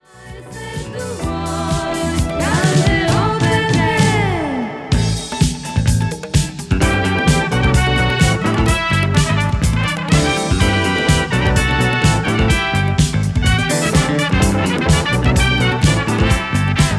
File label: drums, disco